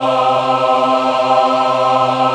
C3 MW AH, Choir; USB Soundscan vol.28; Acoustic & Synth; AKAI

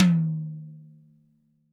TD-20 Tom A, Roland TD-20 Toms

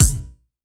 D#, synth line; 90 s Sample CDs; NiceBeats; SynthLine VOL-3

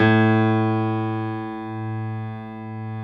piano stereo A 1; AKAI S6000 CD-ROM - Volume 1

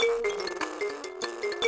Buzz Kalimba; Roland LCDP11 Africa VOL-1

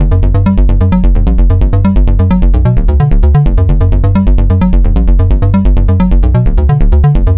NRG Bass, Trance

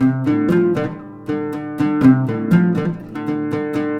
120 LUTH 1, Arabian Traditions; USB Soundscan vol.43; AKAI

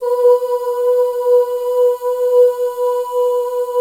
B3 FEM OOS

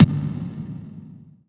trip hop kick; Kicks

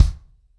100BPM, ACOUSTIC DRUMS

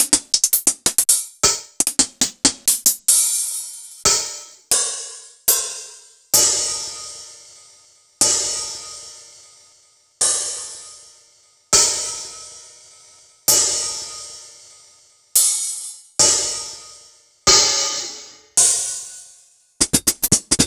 Yamaha DTXpress Hi-Hat; Sliced

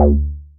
Synths BASS

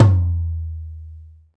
TOM TOM LO; Ext.Snare; Club-50 - Foundations

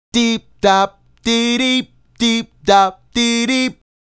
Male Solo, Hip-Hop and RnB Vocals

